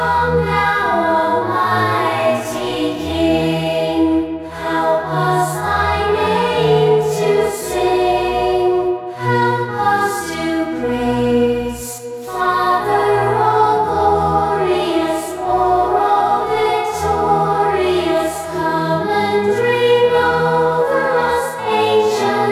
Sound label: choir